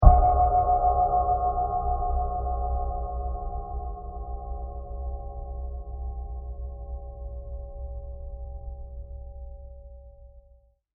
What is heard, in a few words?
Long Wobbling Impact; Bursts; Impacts